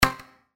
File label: cancel; werewolf